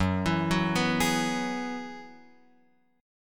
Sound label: Gb 7th Suspended 2nd Suspended 4th Gb down Guitar Open F; arpeggiated; JGuitar